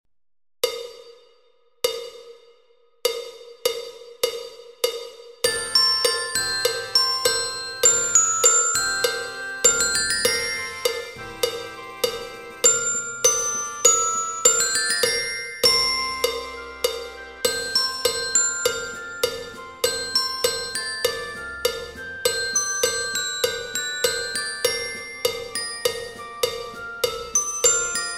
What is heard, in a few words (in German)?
Glocken; 鼓笛